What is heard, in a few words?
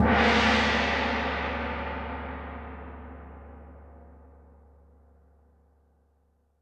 Roland TD-20 Percussion